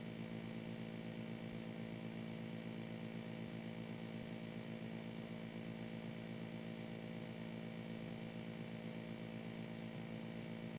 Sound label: light monitor; teardown; ps5 windows xbox series xs